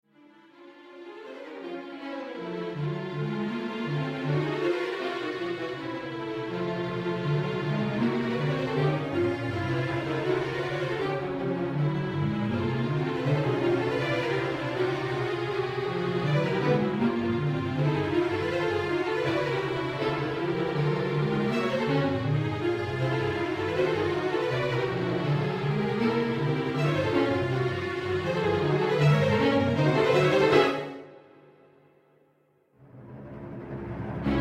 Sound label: dark black music, Classical